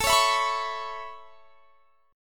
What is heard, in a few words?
A Major Add 9th A, chord; x,24,23,21,24,21; Aadd9 strummed